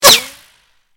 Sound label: whine